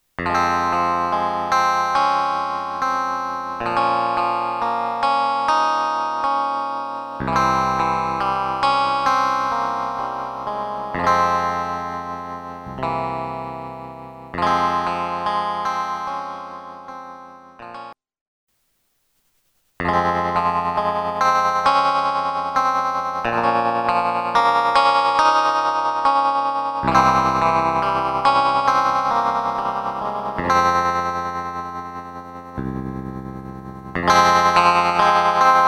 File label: Hard Tremolo; t.c. electronics M300